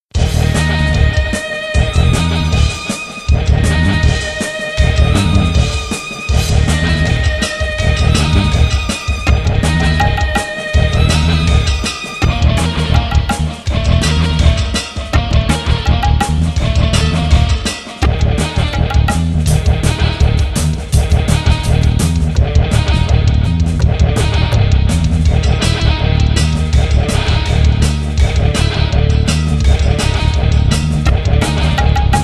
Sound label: Noiser